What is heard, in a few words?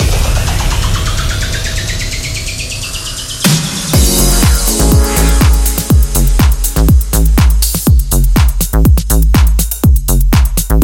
premium drum bundle audio